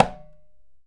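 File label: Conga Open, EMU PX-7 Drum Machines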